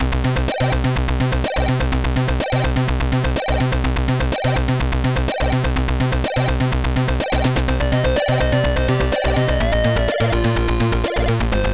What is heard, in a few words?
keyboard sid, mod